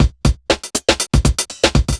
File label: chemical 120, loops, 120bpm